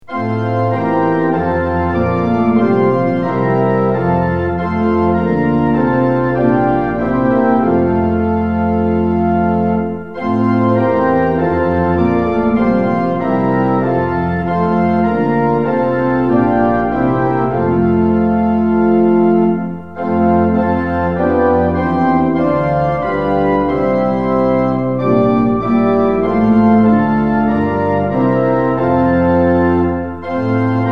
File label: tune; hymns; lillenas